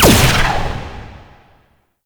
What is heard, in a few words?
Laser Explosion; Enemies; Weapons; Warframe; Tau; Sentient Dactolyst